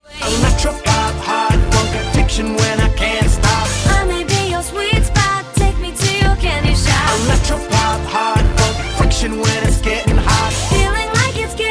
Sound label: Electropop